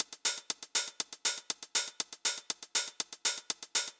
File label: House Drum OnlyHH Reverb